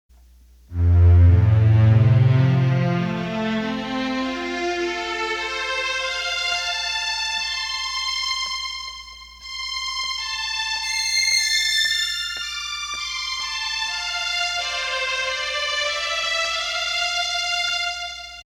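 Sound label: Slow Strings, Roland accordion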